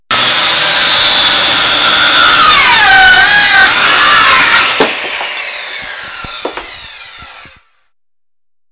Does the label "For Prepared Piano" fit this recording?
No